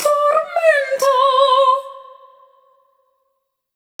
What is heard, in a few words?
Tormento, vocal samples; Sonic Foundry (Sony Creative Software) - Pocket Diva World Class Vocal Samples; Op-Erratic Loops